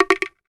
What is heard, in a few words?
blackhole put in; kolf